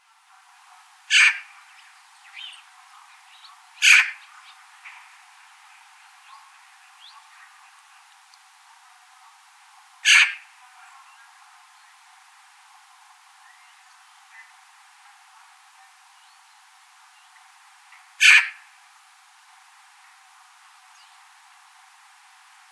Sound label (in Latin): Pica pica; SETE